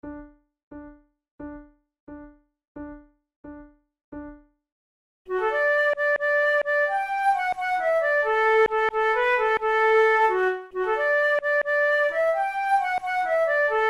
duet

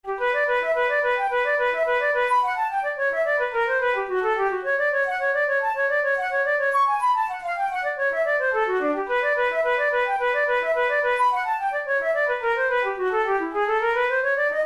caprice etudes, flute

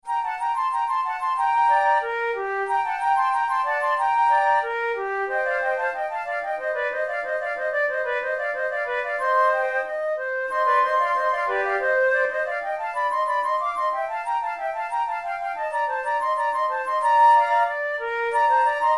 flute sonata prelude; mattheson

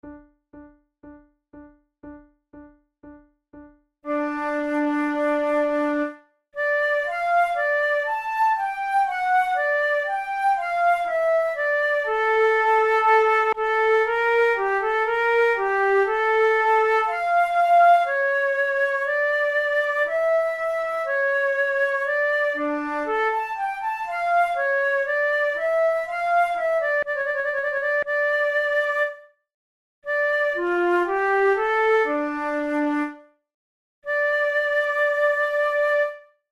prelude, flute

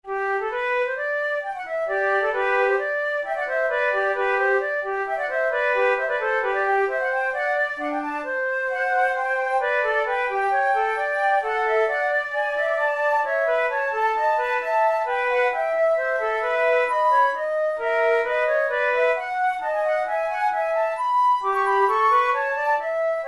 sonata for two flutes in E minor andante

